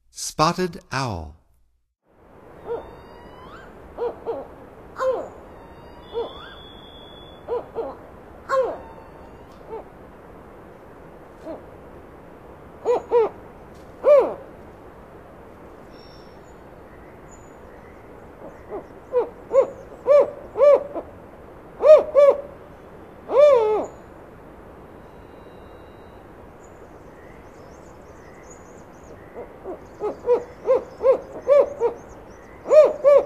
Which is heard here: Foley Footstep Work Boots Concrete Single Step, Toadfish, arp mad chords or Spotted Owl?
Spotted Owl